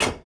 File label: phys impact metal medium rattley; Rust